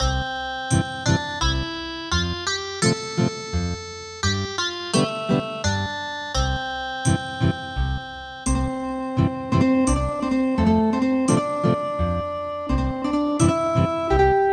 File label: Ethnic Guitar